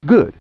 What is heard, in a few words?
Good, Yamaha DD-35